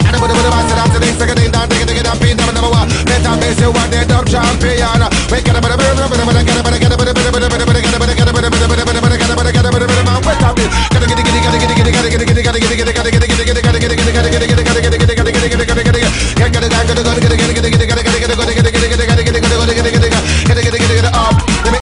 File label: Fast Reggae Rappin